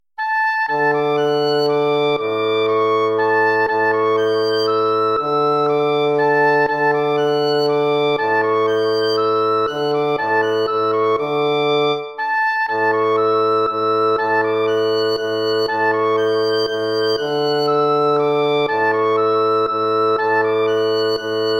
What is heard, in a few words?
waltz; Carulli Ferdinando